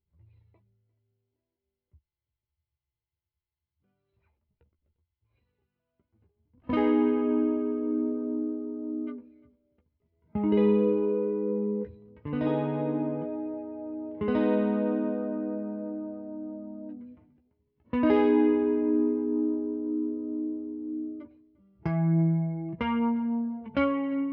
Guitar

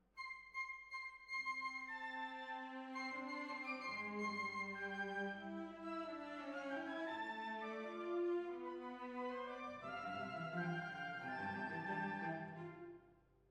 Hall, Jazz for piano

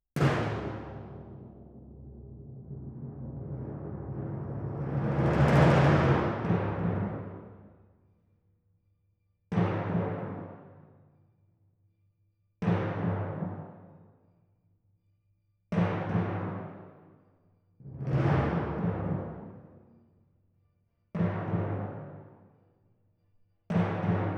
Timp

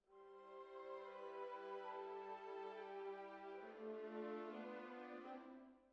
Cellos

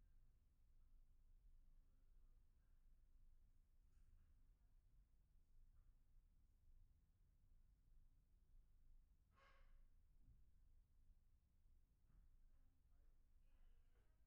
Room, Choir

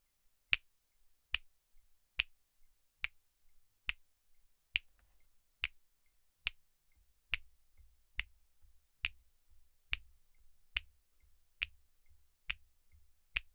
Snaps

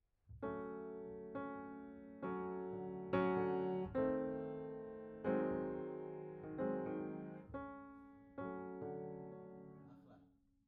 Piano B

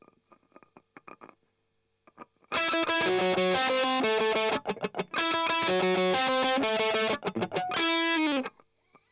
Strum Octav

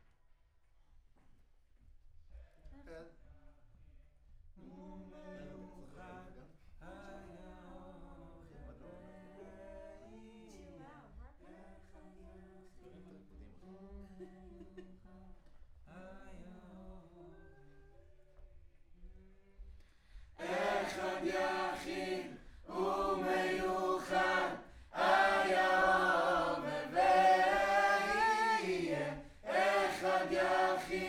Choir; Decca LR

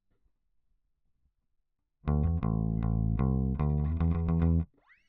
Bass

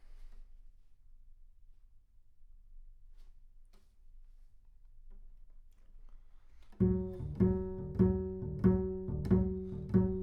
Bass Room

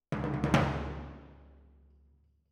Timp Main

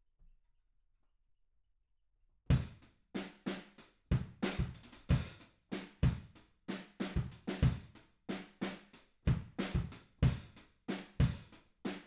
Room

